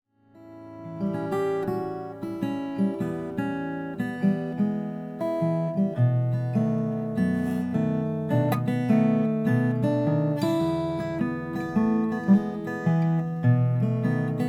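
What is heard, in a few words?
kevin ryan grand abbey parlor indian rosewood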